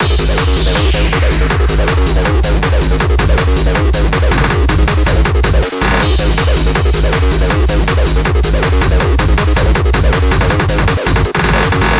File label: Bgm